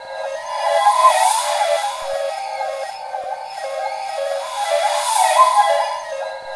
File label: SPIRAL WIND; Spectrasonic Distorted Reality 1